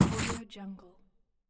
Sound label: scifi strategy building place sound; stormwaveaudio